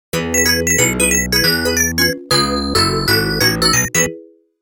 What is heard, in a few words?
game over